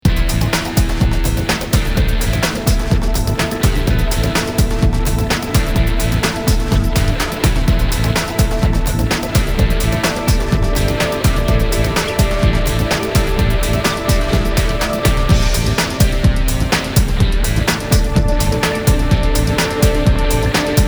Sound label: AltGtr